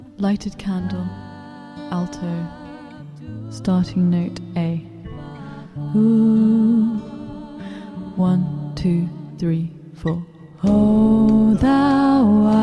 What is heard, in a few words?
alto, choral music